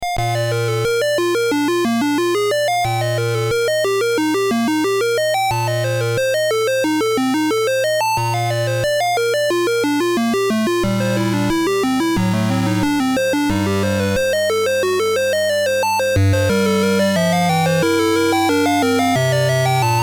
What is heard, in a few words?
Music; Bally Pro Arcade Demo Cart